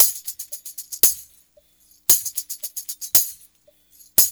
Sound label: TAMB; East West; Funky Ass Loops